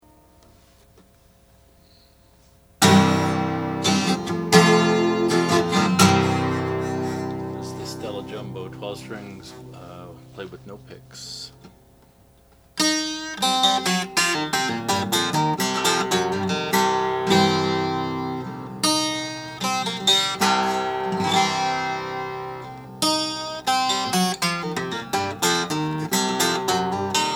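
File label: oscar schmidt stella jumbo 12 string natural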